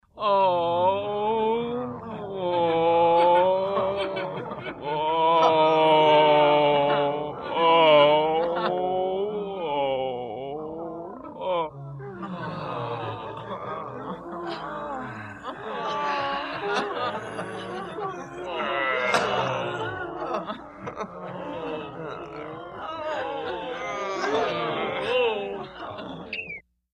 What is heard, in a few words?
Grp Suffering Moanin